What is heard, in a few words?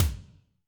Kik Hi Wet Wisp, Alesis D4, Drum Machines